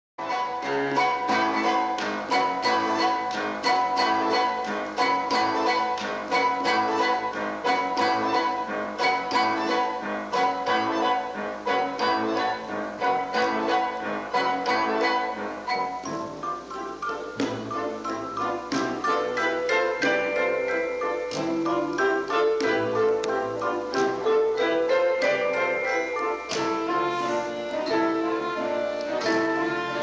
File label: Orchestra; Relache